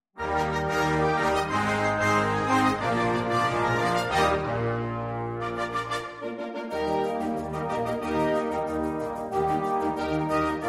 Saxophones - Harmonie

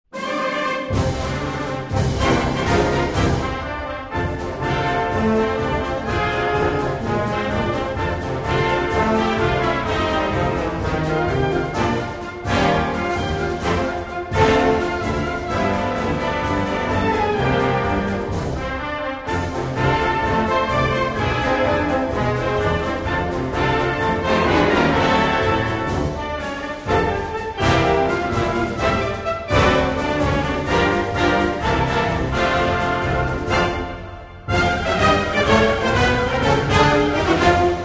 Happy Marching

